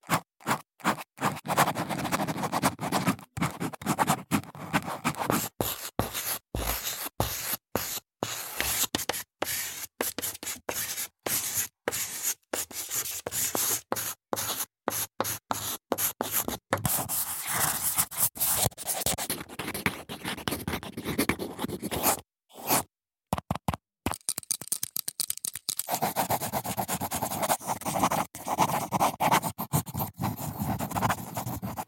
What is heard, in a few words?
Handwriting; SoundBits